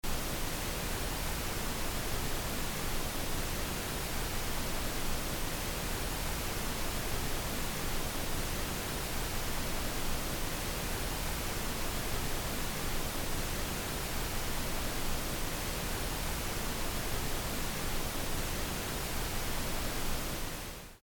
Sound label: pink noise